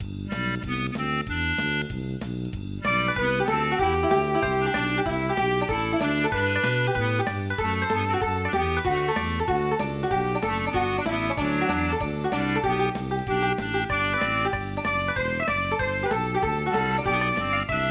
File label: jazz